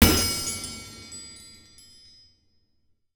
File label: Magical Strike; free game sound effects library